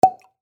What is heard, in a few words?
chat receive; Dice World